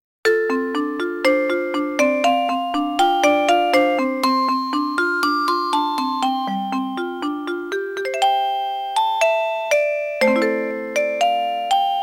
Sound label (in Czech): melodie